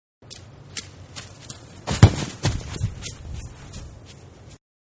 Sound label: Football Kick off tee with running; Sports; Manhattan Production Music